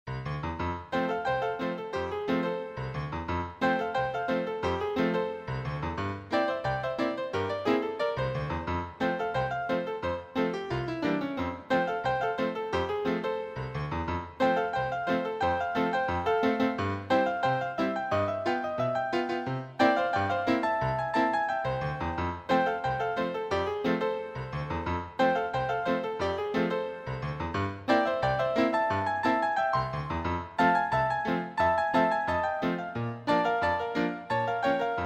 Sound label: hill country flyer - a ragtime excursion